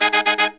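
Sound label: Pop & Funk Brass, 110 Ab, left; Best Service ProSamples vol.25; AKAI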